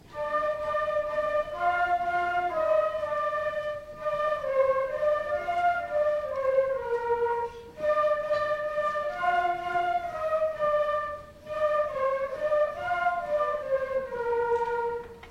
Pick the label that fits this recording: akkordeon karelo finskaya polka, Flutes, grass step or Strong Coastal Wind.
Flutes